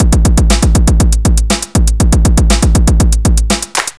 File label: techno